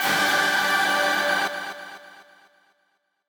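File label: b stab1 a, note G octave 5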